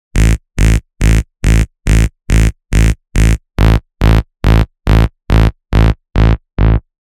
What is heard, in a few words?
Reversed, hard techno; revealed sylenth1 hard techno vol 1